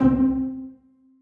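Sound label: basses pizzicato c3; Sonatina Symphonic Orchestra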